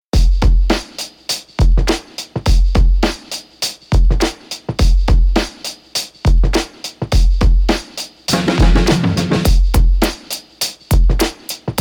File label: crickets bpm 103; full tilt remix vol 044; quick edit